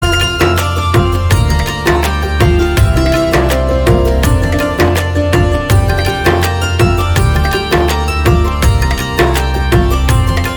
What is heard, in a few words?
INSTRUMENTAL RINGTONE